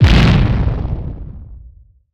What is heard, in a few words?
deep explosion, weapons; ufoai